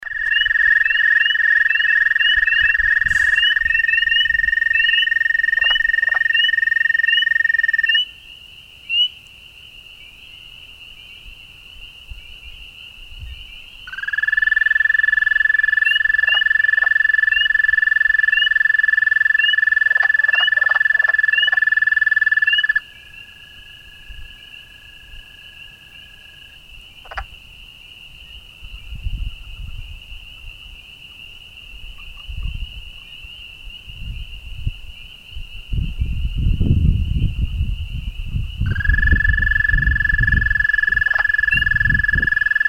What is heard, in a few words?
Peepers Dwarf Toad Wood Frog